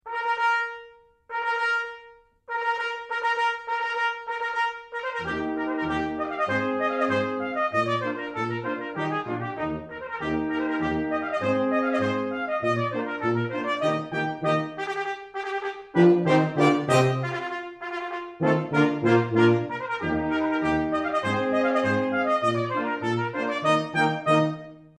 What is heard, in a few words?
Quickstep; Lilly Bell Quickstep by G. W. E. Friederich